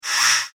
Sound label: Cutter Droid Laser Saw, robot sound effect; science fiction sound effect